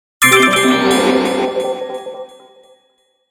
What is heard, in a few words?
Shine Burst Twinkle Delay, Shimmer Ring Pan High; High Tone; Blastwave